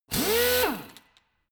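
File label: impactor soft long, drill; maschinen; bohrer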